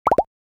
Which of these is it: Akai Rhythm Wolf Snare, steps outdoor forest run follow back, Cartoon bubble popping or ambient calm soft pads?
Cartoon bubble popping